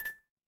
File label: ui menu